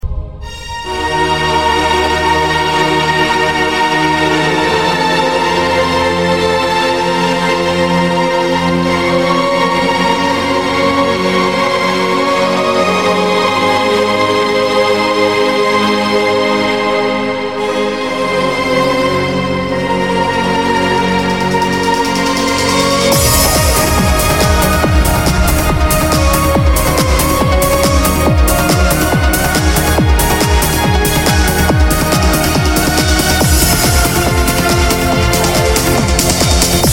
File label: Adagio For Strings, Remix, Ringtone